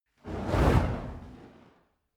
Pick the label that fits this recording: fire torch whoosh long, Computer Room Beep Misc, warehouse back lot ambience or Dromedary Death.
fire torch whoosh long